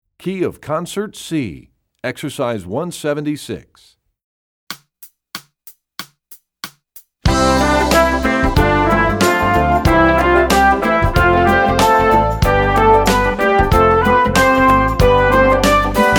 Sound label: Studies Concert C; EE2000 Book2; Rubank